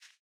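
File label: Footstep Grass; Boomerang Fu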